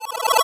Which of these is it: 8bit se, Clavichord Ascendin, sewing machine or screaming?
8bit se